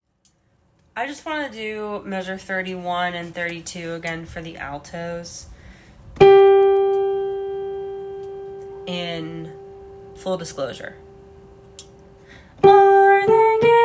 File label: altos